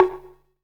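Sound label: percussion FX, Dance Drums; Best Service; Dance Mega Drums CD2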